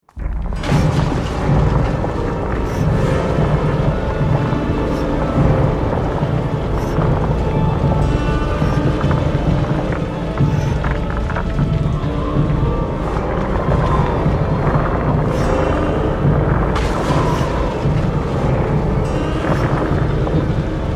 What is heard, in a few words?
storm